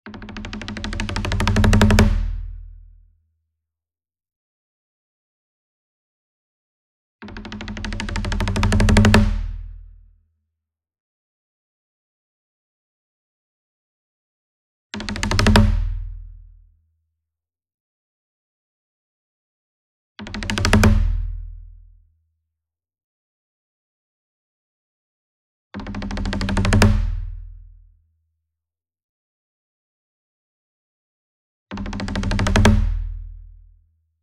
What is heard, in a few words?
SINGLE DRUM Kodo Roll Hard Beater Near, Cinematic Strikes; boom library; Cinematic Strikes Construction Kit